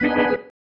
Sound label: Stab One Shots, Organ